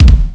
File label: damage